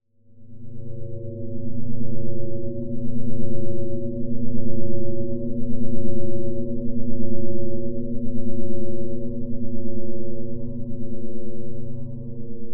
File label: science fiction drone spaceship interior lfe pulsating pan